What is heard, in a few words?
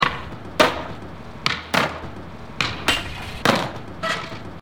Skate Break